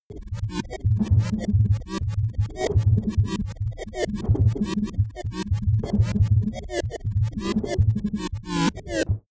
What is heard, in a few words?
sequence crazy noise percussion lead unstable